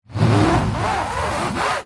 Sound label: foley fingers rub scratch canvas art picture long